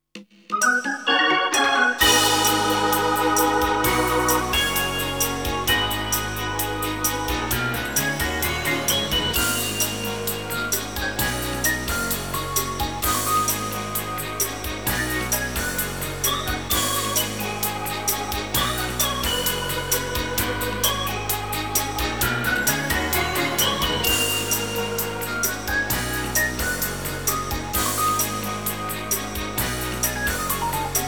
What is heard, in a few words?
B3 Organ; Masterbits CLIMAX 8